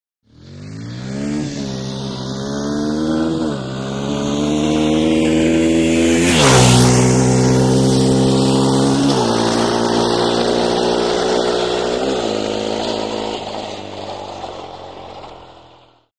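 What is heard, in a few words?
Motorcycle; By; Trials Bike Fast Up And Past Mic.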